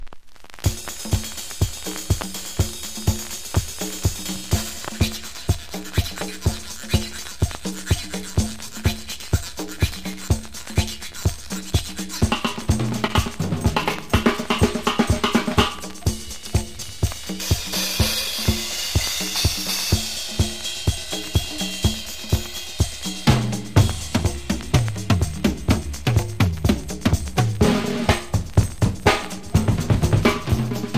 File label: dueling drums